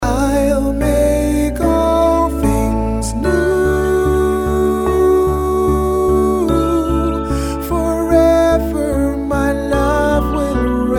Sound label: ring tone